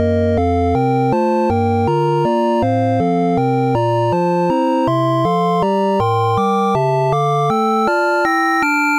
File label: basic bass